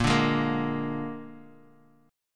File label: Bb 7th Bb down Guitar 4E,4A,5D,5G,5C,6F; Bb7 strummed